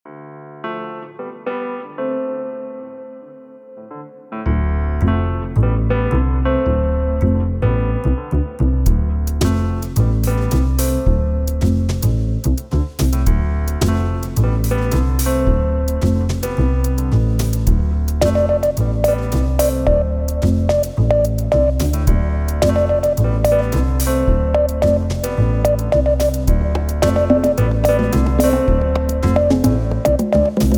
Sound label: morse song